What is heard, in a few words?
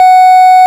ORG, Farfisa Clarinet